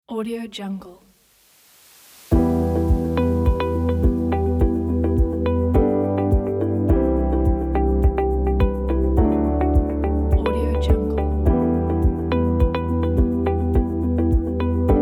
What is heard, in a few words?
ambient corporate